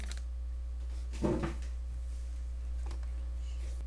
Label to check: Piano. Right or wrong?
Wrong